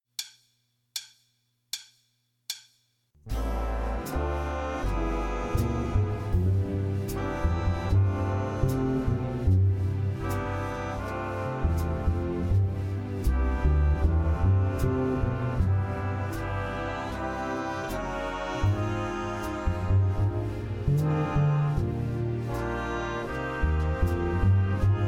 bass solo

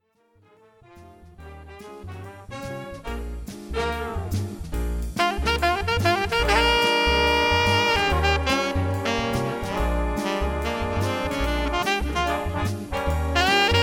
alto sax

